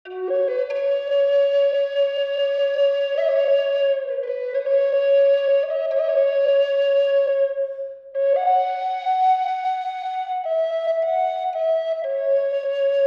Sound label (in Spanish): maple F, flauta